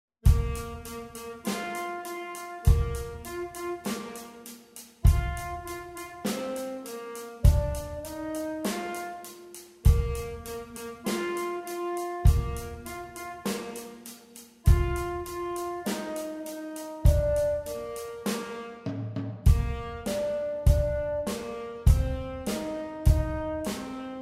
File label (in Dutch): Rock Tenorsax